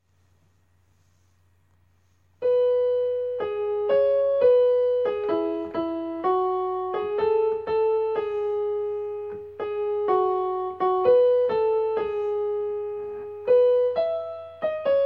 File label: soprano plonked